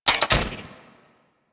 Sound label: Spear Gun Mech, Weapons; Warframe; Corpus